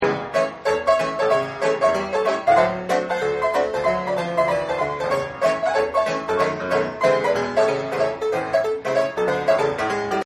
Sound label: Player Piano; Pianos; Musical